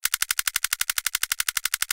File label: count loop, big win